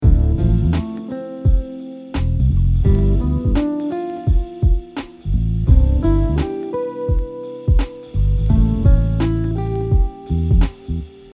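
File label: Chord tone arpeggios ascending, guitar; Chord Tone Arpeggios - Ascending pattern 1; Root notes are marked as "R."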